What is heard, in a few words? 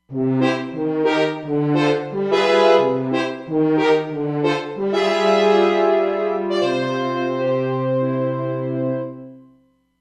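French Horns Section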